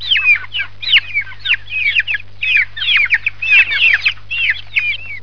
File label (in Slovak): Vtacik; Revelation Sight and Sound SK